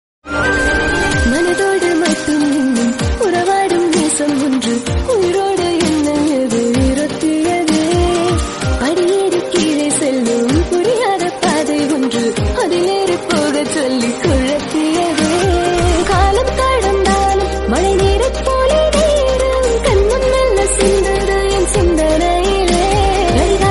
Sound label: Tamil Song Female Version